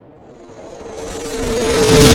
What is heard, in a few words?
incoming